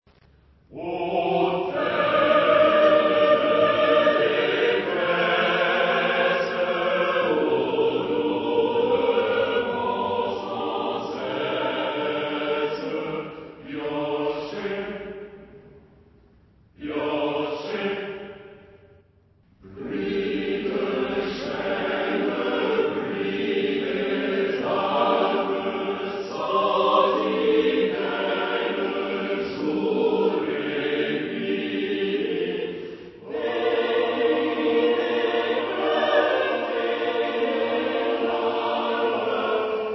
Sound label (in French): chant des marais